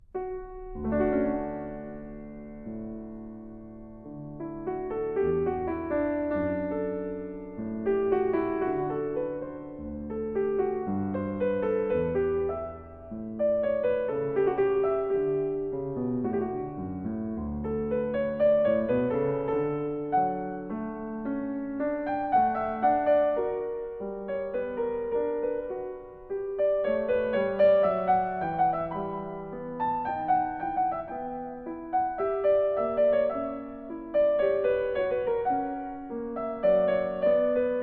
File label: Partita No. 4 in D Major BWV 828 II Allemande